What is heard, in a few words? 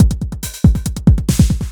Elektro Beat Pattern